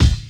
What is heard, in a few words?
original kick single shot A key; kick drum samples